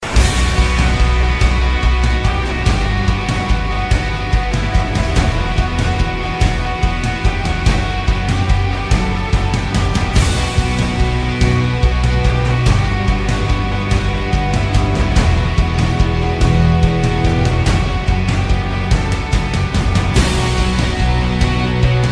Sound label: Epic Music